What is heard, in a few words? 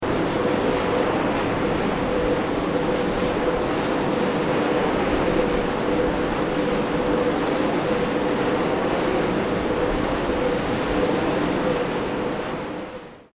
wind turbine